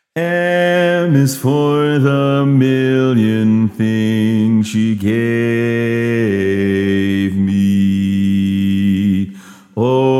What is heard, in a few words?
Bass